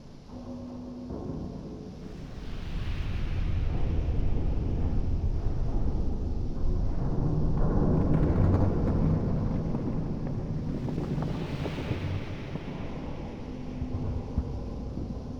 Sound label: atmos deep docks exterior; Hollow Knight: Silksong